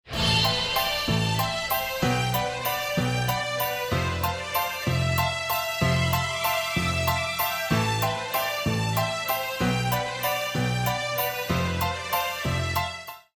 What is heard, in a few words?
lost round; music kits custom